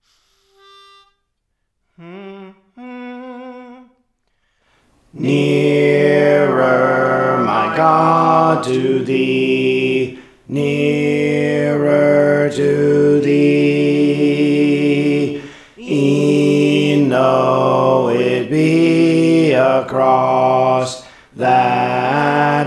Alto, Songs; Praise For The Lord Training; Nearer My God to Thee

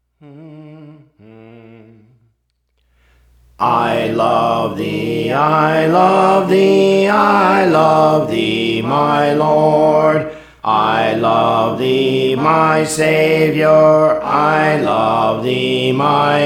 Alto; Praise For The Lord Training

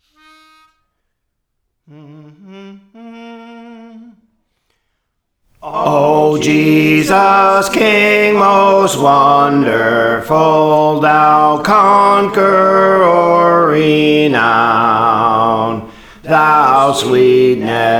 Bass, Songs; Praise For The Lord Training

